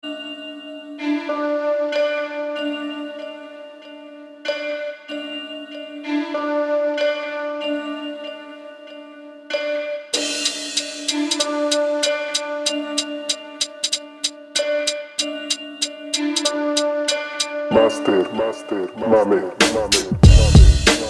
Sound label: 95BPM